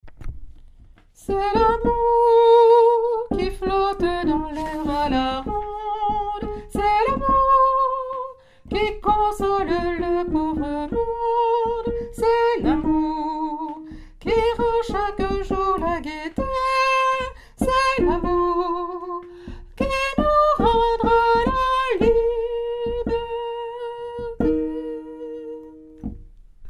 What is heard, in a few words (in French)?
basse mesure, chorale; saltimbanques